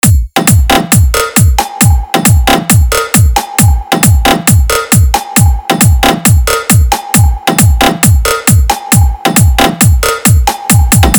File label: Hard Bass Vibration Beet Dialogue; Allahabad